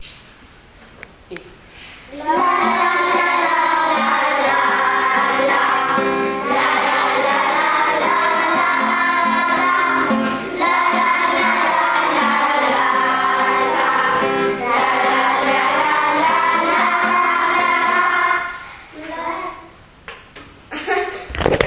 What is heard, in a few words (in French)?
Ostinato